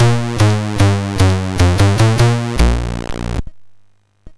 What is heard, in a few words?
Aurum, MOS6581R4